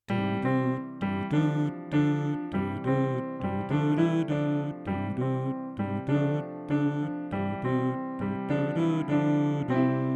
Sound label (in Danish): Sopran